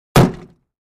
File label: Plastic Car Door Hit